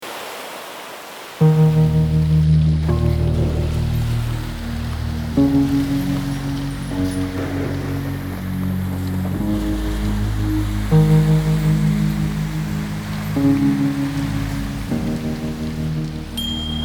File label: Rain Instrumental